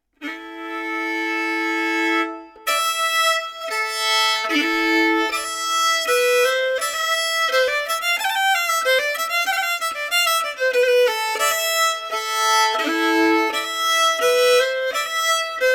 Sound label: Svensk Polska